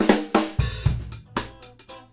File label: FILL